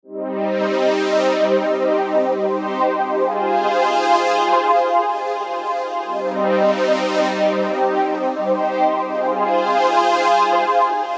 Metroflute SDD320 Chorus 120 C

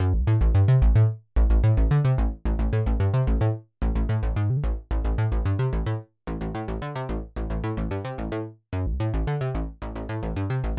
OB6 Factory Preset; portiert auf P6

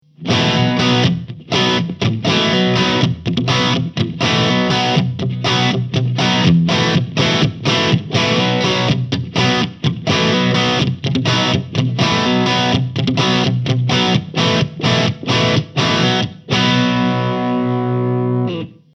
EG Crunch; RAW DAWG 60

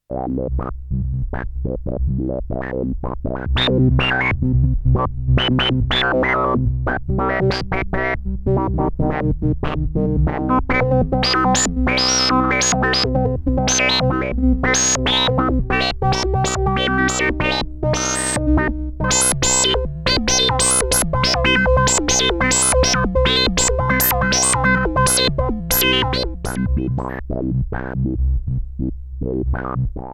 Behringer Pro 800 Random Voices

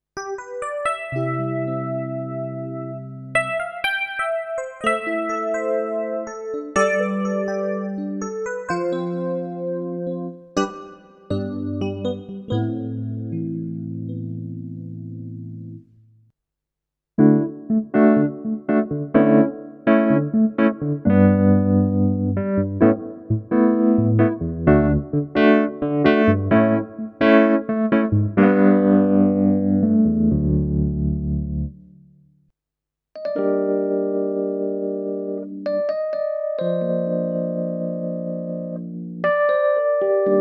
E Piano

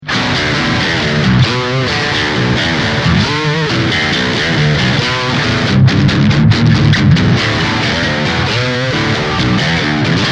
Might Be Heavy

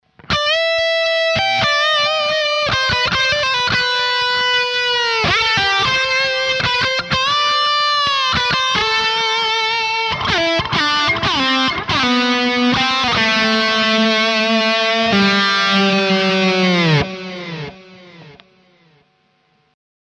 Eventide Ultratap Delay

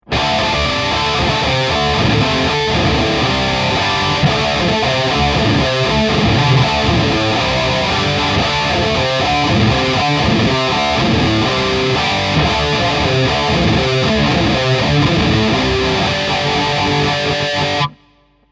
Detune

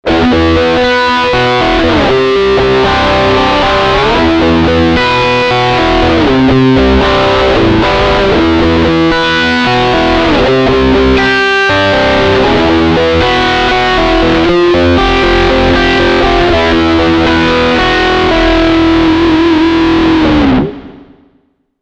Gain-O-Matic

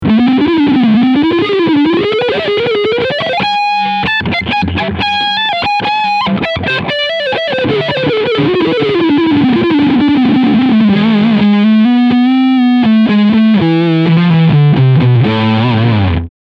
Harley Benton SC 450Plus Overdrive Front Pickup

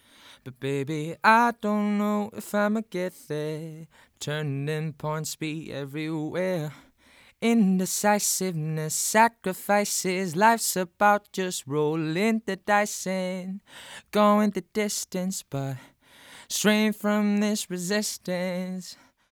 VOX EQ

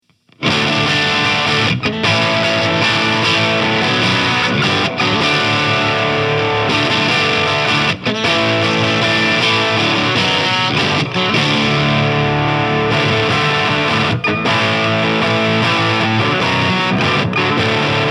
Chapman Guitars ML1 Modern Baritone Crunch

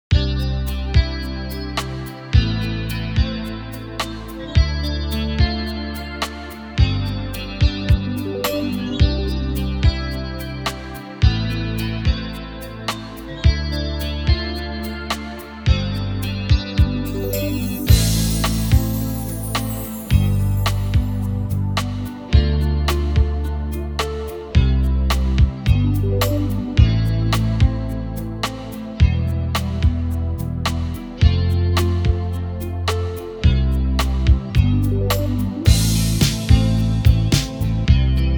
Soft Pop Ballad; Genos V2 Superior Pack

